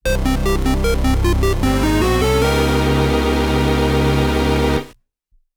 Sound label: Scary Elevator; Shantae Advance Risky Revolution Original Soundtrack (2025)